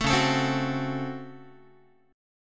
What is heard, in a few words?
Db Major 7th Suspended 4th Sharp 5th, Guitar; 12,9,12,10,10,x; 4A,4E,5A,5D,5G#,6C#; strummed; chord shape